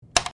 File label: klick